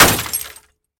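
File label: short ringing sound of broken glass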